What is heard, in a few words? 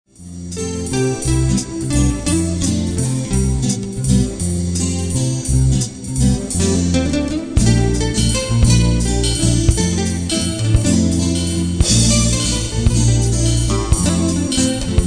Jazz Waltz